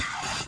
Pen; Play&LearnRC

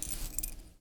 foley cloth short; Earth and Beyond; EA Games

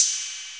808 CYMB; Groovy Bytes: Behind the Moon; BAS PERC

